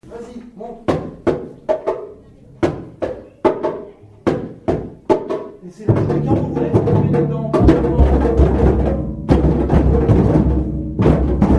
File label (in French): tambour